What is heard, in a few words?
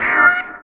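HORN, left; East West - Phat & Phunky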